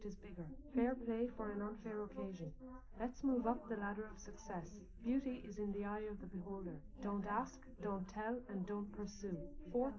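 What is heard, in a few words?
female, live recording 250ms 5cm; SiSEC11; underdetermined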